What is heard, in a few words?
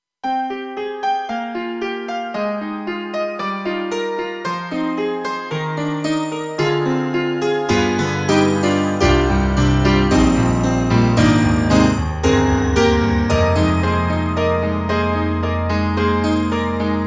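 glassy stack, TRITON Rack, piano; KORG EXB-PCM08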